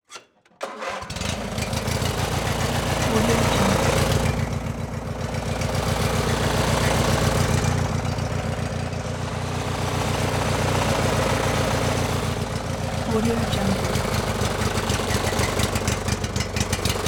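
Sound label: motor running maneuvers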